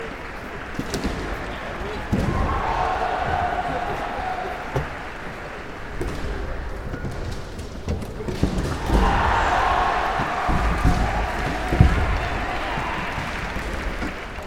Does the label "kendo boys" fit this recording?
Yes